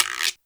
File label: Guiro; Roland R-70; Perc